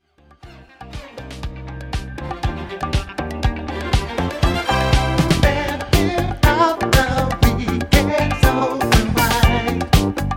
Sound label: 80s 120 Bpm